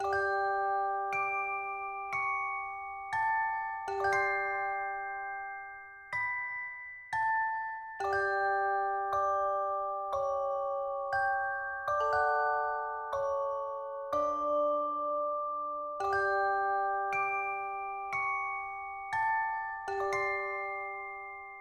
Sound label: Music Box Version; M&LBIS+BJJ